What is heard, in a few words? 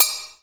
BELL JPN, JAPANESE PERCUSSION; AKAI S6000 CD-ROM - Volume 5; Ethic